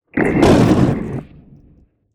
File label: Spineling giant damage